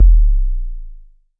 deeep n low, jungle bass

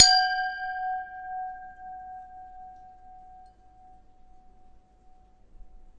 glass camerton ish, crystal glass strikes; UNUSUAL KITS and FX

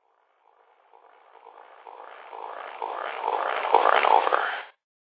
over and over, pain pack sweeps and fx; REMIXABLE COLLECTIONS; Ze Franks Pain Pack

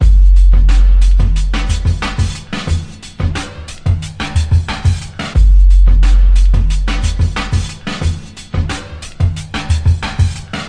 90 bpm, entirely too huge kicks phasebeat

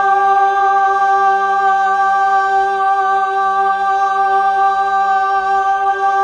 Fem G2, Mellotron Female Choir

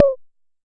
Jomox MBrane 11, drum machine; drum machines

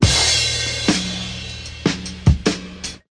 075 bpm big crash snare break

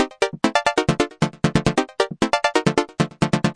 old chord sequence; SYNTH AND ELECTRONIC LOOPS

